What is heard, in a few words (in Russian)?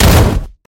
silnij grohot ot udara metalla ob pol